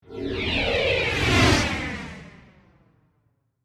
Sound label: Whoosh Swirl, Slow, Accent, Transition; Production Element, Imaging Element; BlastWave